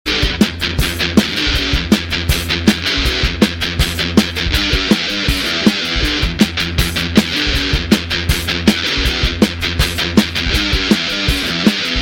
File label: metal riff loop ringtone